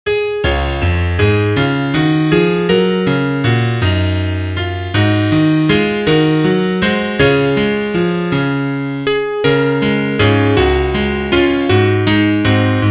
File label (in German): Klavier; mein hut der hat drei ecken